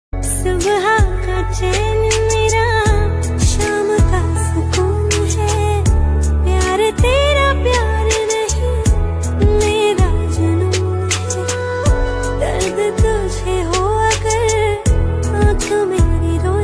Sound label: Romantic Hindi Song Ringtone